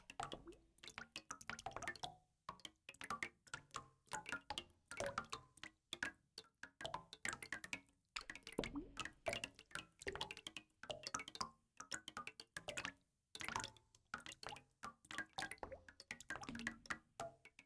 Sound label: Weather Rain, Small, Designed, Metal Plate, Asynchronous Drips, Loop; Frogsong